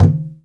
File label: GENDANG, GENDANG DRUM; Spectrasonics - Heart of Asia CD2